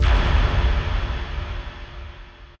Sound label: FX BD long